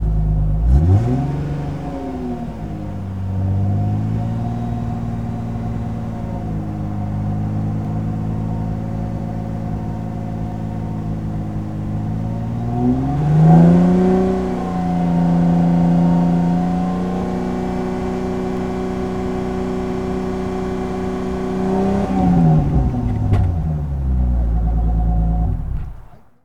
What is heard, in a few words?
Chrysler 300M Fast Idle Off, Automobiles